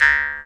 D JAW, Primitive Instruments

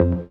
DM ORG1, Dance Mega Synth

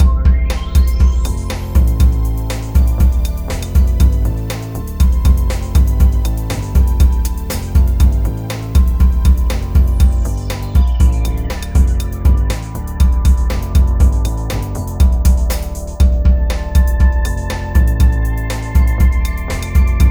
Zero Beat, Hip-Hop; Sonic Foundry (Sony Creative Software); Hydroponic Hip-Hop; Combo

